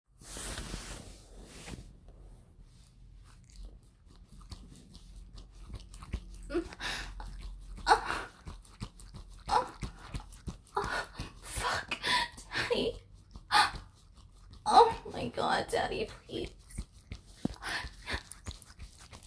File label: Quick wet noises; for Daddy